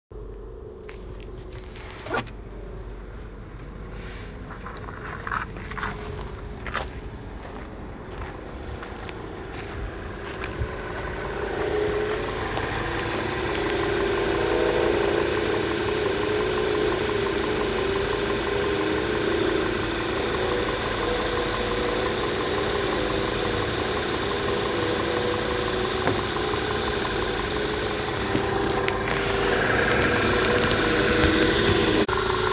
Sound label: tiguan 2 0 tsi noise; volkswagen tiguan